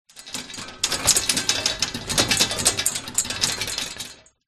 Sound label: household mirror floor standing shake wobble